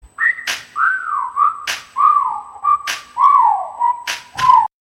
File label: hip hop whistle; ringtones